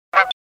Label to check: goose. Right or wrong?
Right